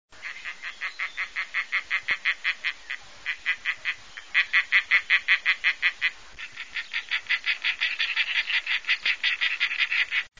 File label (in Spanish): canto alimoche; aves campo